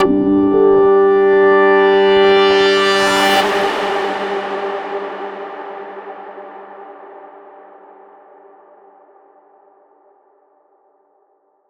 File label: Bpm141 Organ Loop G, Dubstep; Lucky